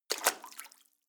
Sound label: step water sneak in